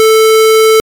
rect f440hz

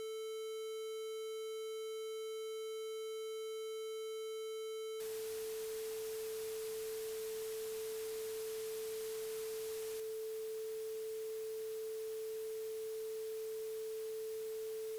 noise shaping

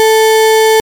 saw 3step f440hz

